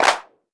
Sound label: hand clap sound f sharp key, Royality free clap tuned to the F# note. Loudest frequency: 1807Hz; single hits one shots